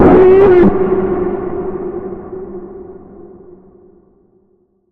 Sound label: Hard To Bend, F sharp